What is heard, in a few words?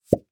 Household Plunger Pull Off Cement